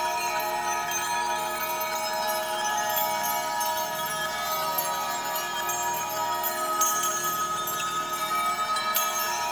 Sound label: Drone Loop; Warframe; The New War; Narmer; Grineer